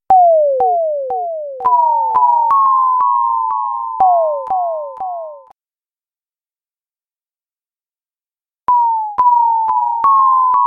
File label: perfect sms tone 3d